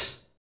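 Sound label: hi hat; Best Service Gigapack 2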